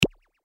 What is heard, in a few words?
button Click; Oddbods - Samebods